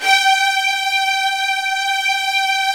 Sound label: HDS G3, Virtuoso Strings; ILIO; Kirk Hunter Virtuoso Strings VOL-1